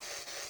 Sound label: inserter fast; factorio; Factorio 0.14.21